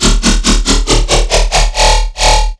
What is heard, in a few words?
Riddim Bass Hit D#; One-Shots; Bass Hits; Ghosthack; Riddim Essentials Volume 2